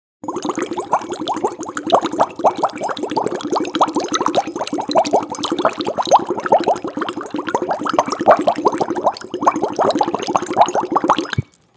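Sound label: sound of bubbling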